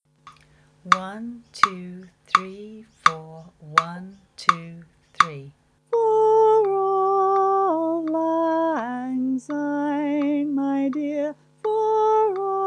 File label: lead coda in C; Auld Lang Syne; Folk Choir music